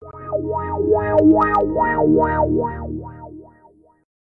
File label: windows xp wobbly sound